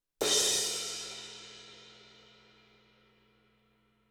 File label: 18 CRASH CRASH, cymbals